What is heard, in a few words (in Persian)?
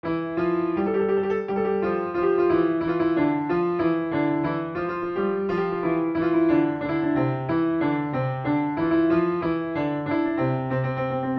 Zanguleh, piano; Rast-Panjgah; Radif Mahjoubi